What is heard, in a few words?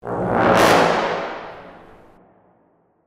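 Sting Small Brass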